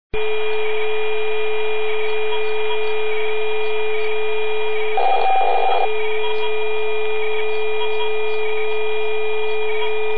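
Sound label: Snezhnye zaryady, snow static charges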